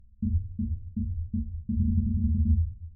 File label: REFLECTIONS Rich Nonlin Roll off